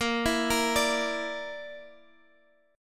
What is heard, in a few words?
Bb Major Flat 5th Bb down Guitar Half Step Down, chord